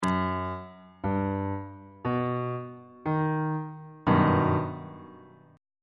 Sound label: a double flat augmented major 7th 3rd inversion; bass clef